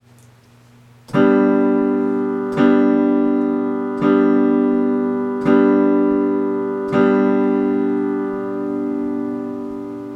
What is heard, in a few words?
chord old folks at home